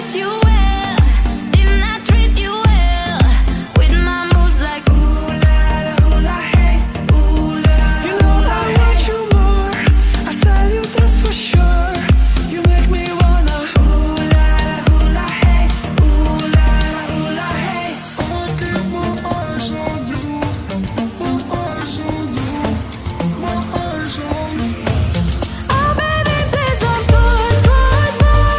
English Ringtone

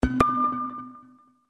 Notification Ringtone; Oukitel C36

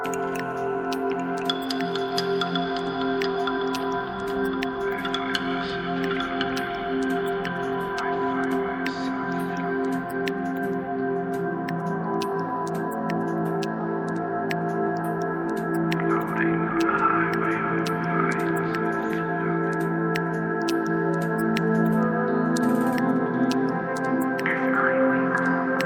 Huawei P Smart Ringtone

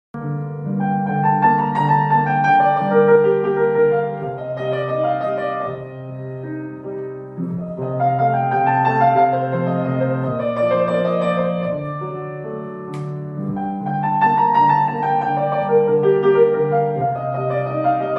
Guitar Music Ringtone

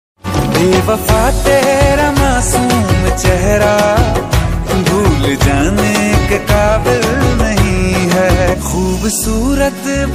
Hindi Sad Song Ringtone

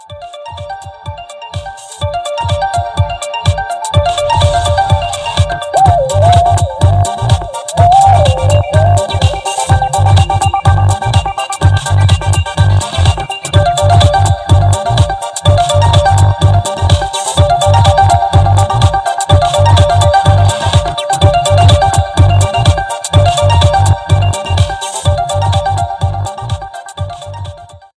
Vivo V23 Pro Official Ringtone

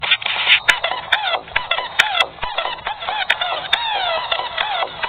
Oppo A71 Sms Ringtone

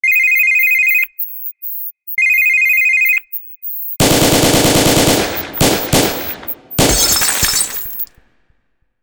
Phone Ring and Gun Tone

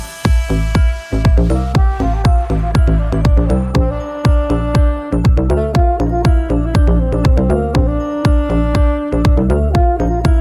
Turkish Mix Ringtone; Samsung

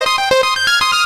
Samsung Notification

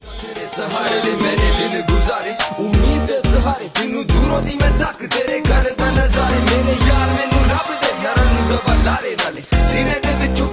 Rap Punjabi Ringtone

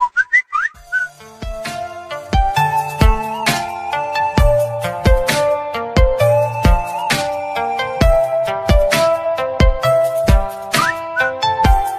Samsung M31 Ringtone

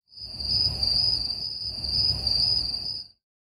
Huawei Ringtone

